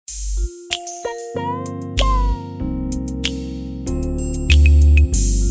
Cool Sms

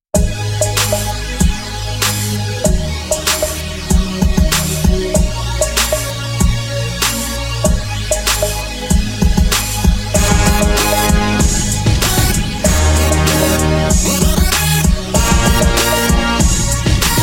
Hip Hop Brass

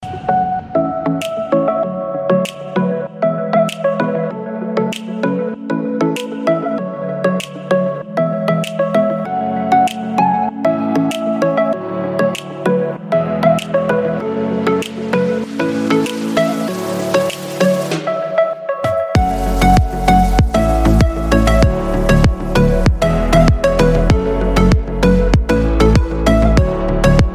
Electronica

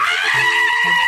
Toad scream

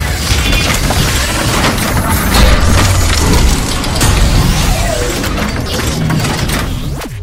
Heavy Transform

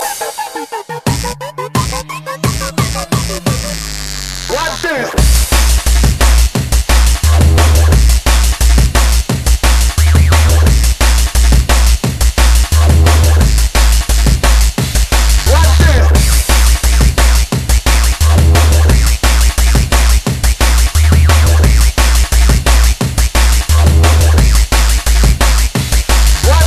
Dance Mix

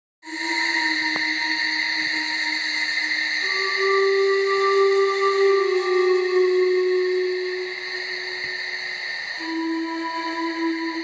Pipes; Ouat Peter Pan

